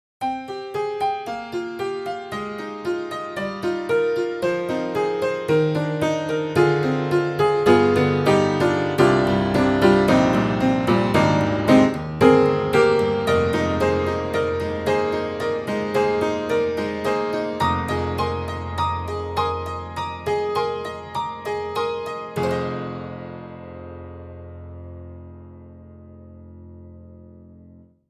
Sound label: Upright Pianos Tack Piano Juke Joint Tack Piano; Synthogy; Ivory II